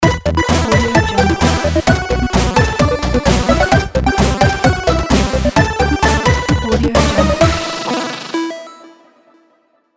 8bit chiptune logo; petruality

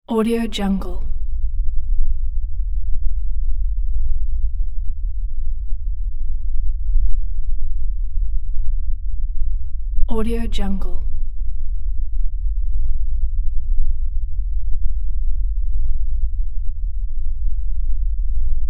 space deck or engine room ambience

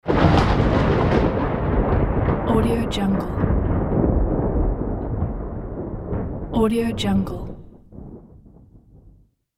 thunder

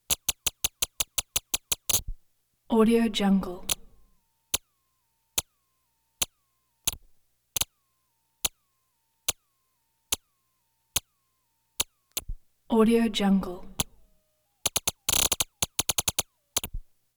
telemetry sharp staccato chirp tones

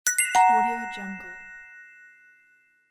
interface game diamonds sound

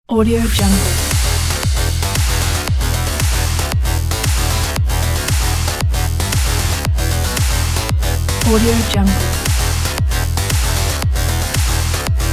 aggressive sport pack

